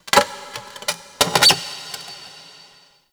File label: Terrarium Glass Stress; Warframe; Ambience; Entrati